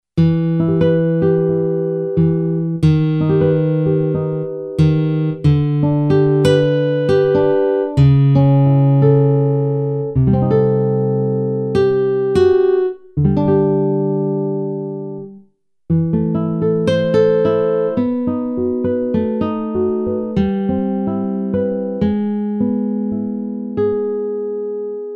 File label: Nylon Guitar